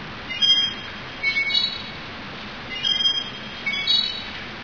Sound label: mill, ambience; Source Engine; dod